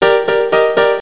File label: Piano, Cisco Unified IP Phone 7945G